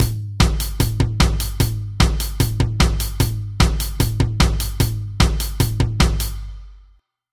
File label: Beat